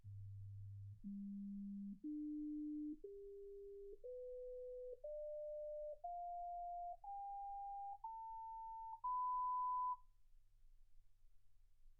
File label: sinus tones 48kHz